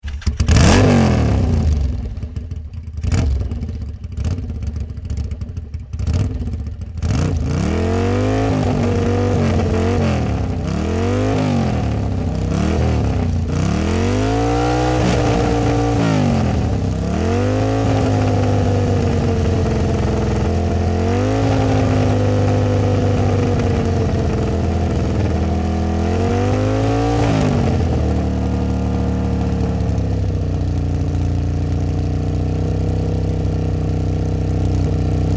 Motorbike Honda 250 Stationary Revs and Running Engine; Start revving running and off; Motorcycle